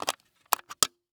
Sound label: Technology Electronic Camcorder Panasonic Dvx100 Removing The Lens Cap On